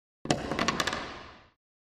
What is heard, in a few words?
Racquetball Racquet Drop